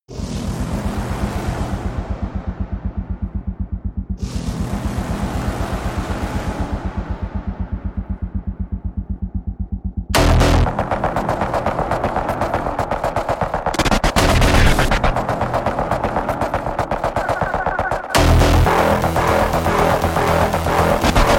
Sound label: far east mix synth